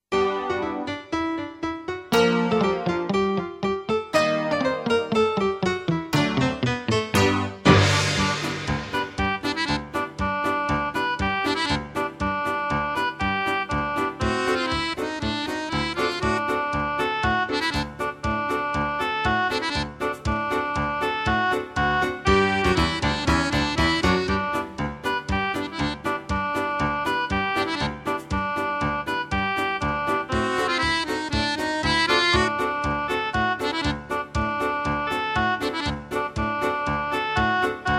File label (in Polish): wersja instrumentalna